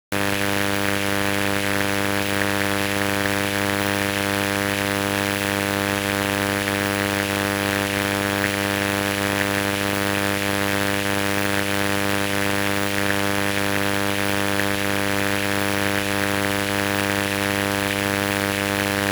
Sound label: Electrical Interference Crackle Drone; Glitchedtones; Noise Elements Electrical & Interference